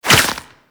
Infested Tendril Step, movement; Zeke; Warframe; Infested Lich

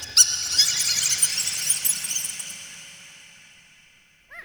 Small Critter Squeaks, Root Cave; Ambience; Neathuns Root Cave; One Offs

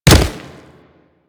Kubrow Land Dirt, Footsteps; Enemies; Warframe

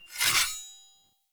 Short Sword Equip, Enemy Swords; Weapons; Sinecure Short Sword

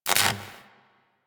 Worker Welder Stop; Grn Worker Welder

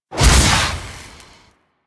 Fire Shot, Flame Thrower; Weapons; Warframe; Ignis Wraith

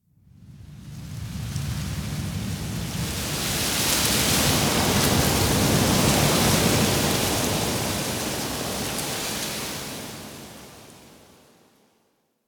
Tower Wind Texture; Warframe; Quests; TheNewWar; Ambience; Cetus; Unum Tower

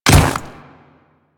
Ice Step, Footsteps; Royal Guard

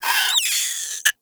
Forest Rodent Vocal Death; Characters; Warframe

